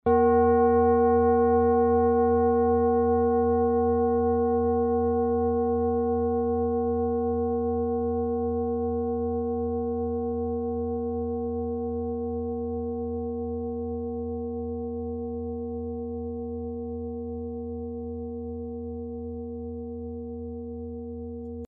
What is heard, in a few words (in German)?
klangschale nepal, Klangschalen Nepal